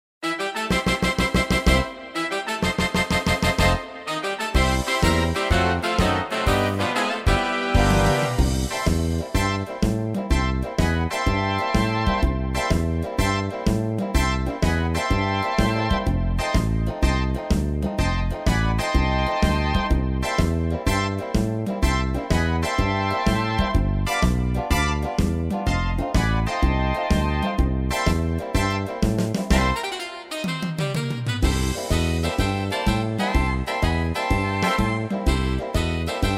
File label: Keyboard jogging Boogie, piano; pistas-piano